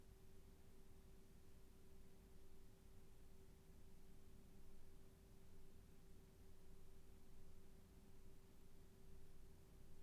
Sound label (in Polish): Szum bez nałożonej czapy czysty, Mikrofon Snab Microtone HF-50; nieobrobionej próbki bez założonej czapy; odległości z jakiej do mikrofonu mówiłem – było to ok 45cm